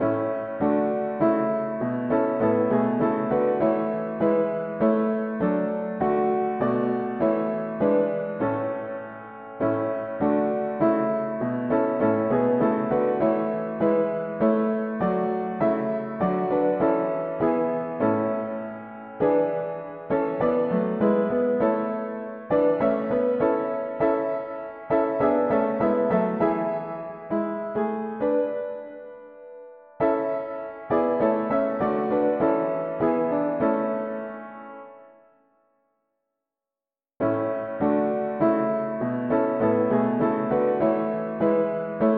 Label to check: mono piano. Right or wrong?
Right